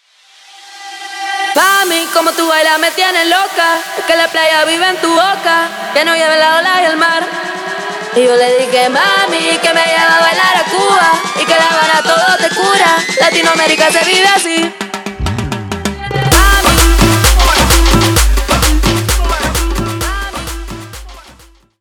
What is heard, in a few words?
intro outro 130 bpm